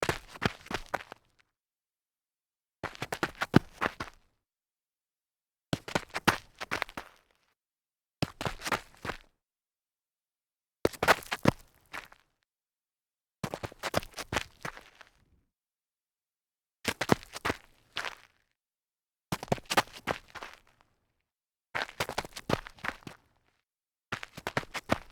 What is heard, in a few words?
sneaker stumble forward grit, foley